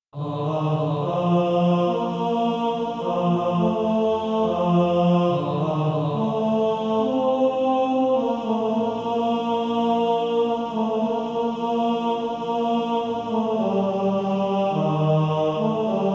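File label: Male Choir, tenor 2; Jesus Lover of my soul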